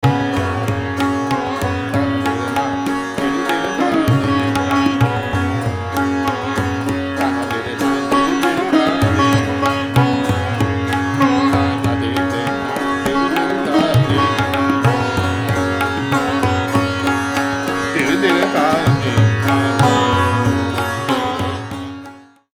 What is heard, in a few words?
TINTAL Rageshri